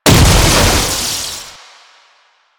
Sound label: Scythe Proj Die; Stalker Jade Scythe